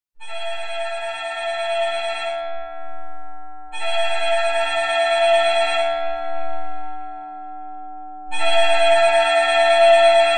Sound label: alto